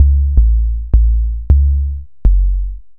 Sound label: Sub Bass; Sonic Foundry (Sony Creative Software); Knutrix Drum & Bass